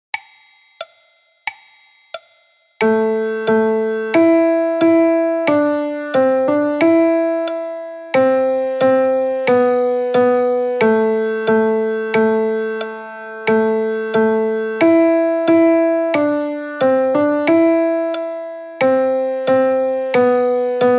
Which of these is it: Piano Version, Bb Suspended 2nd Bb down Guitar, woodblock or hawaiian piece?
Piano Version